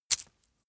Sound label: metal pouch impact rich